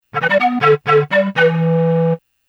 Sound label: Play Flute; CloudRunner Flute